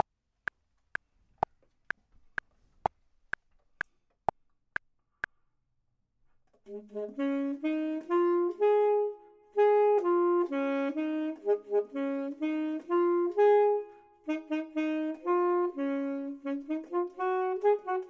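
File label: bottom, Saxophone and Clarinet; voxman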